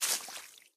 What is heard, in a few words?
mud land; Golden Crayon Sounds